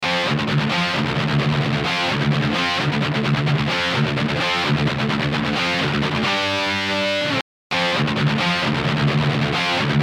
Guitar dry sat

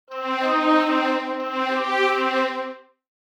String

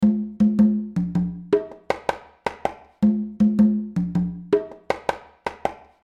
Perc Real Percussion

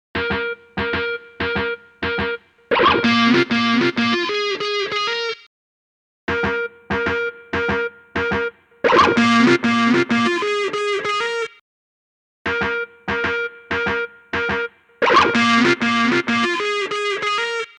Dry Dist EQ

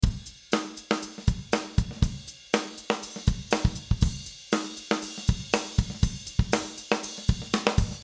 Drum Wood Room

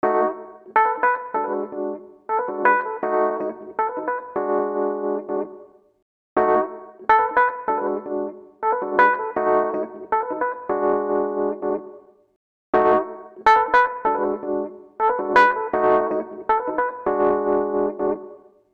Key, Mullard Short Plate

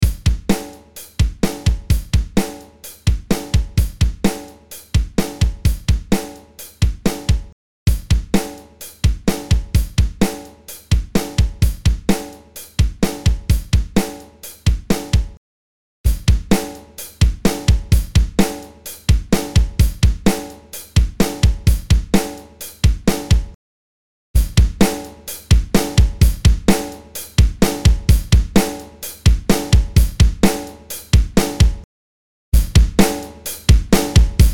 Drum Studer Mix

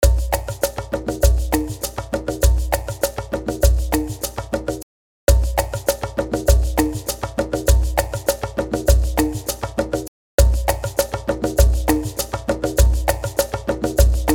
Perc, Mullard Short Plate

